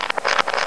domino; Sound Effects for Multimedia - Volume 1

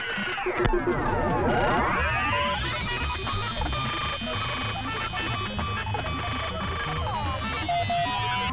computer; wh40k

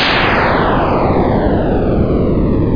level end; Prima v0.1.1; Prima Sounds

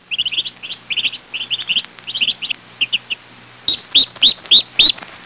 chirping, birds; Sound Effects for Multimedia - Volume 1

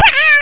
ARHHH

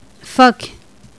seal

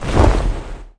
eagle wing

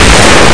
book

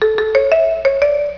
Tune Intro; Tetris Max 2.3.1

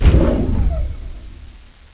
kneel, tank; q2

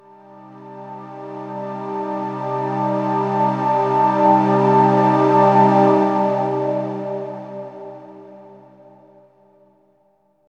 SYNTH PAD AMBNT 160 C, Ambient; eJay; Sphere

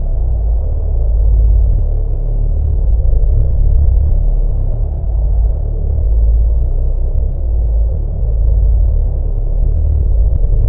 space tone

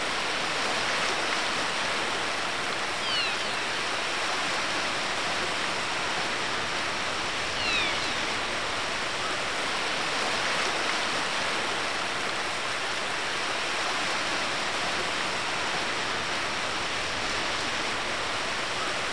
Sound Ocean Distant

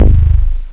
DBASS; 0.09 seconds; Scream Tracker Sample

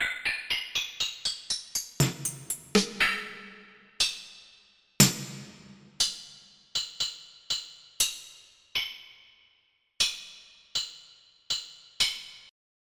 Snare Stacks

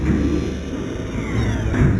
arm Swap; PC Gamer IT CD 48; Big bang

